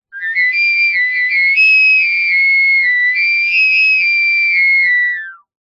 foley pipe plastic tube air blow through unharmonic shrill musical tone